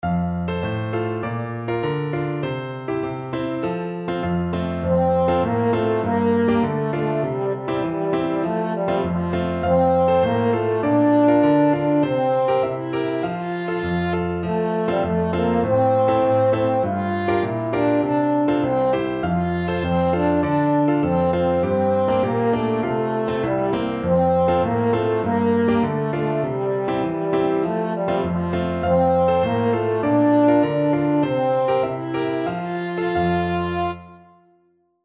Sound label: amen shem nora, french horn